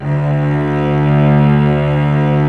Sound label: ARCO CELLI; OMI - Universe of Sounds